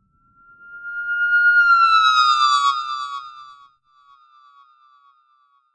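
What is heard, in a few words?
Filter Processed, alarm siren samples 125bpm; musicradar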